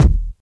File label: short steel kick drum sample D# key, Royality free steel kick drum tuned to the D# note